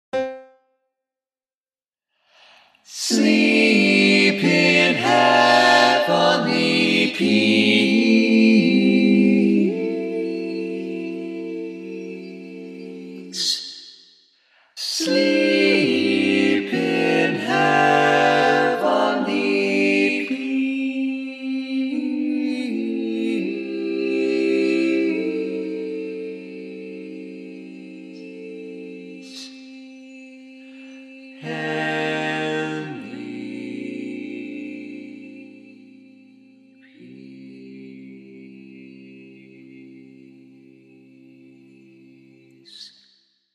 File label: barbershop tags, all parts